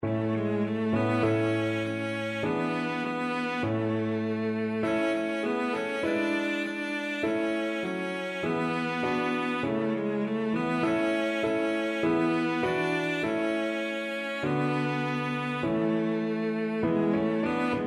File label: cello